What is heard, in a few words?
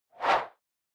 Camera Motion High; シーン・カメラモーション